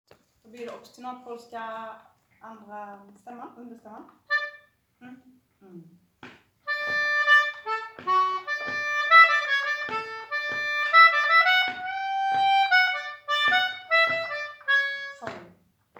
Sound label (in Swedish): Obstinat stämma; Brevduvan; Polska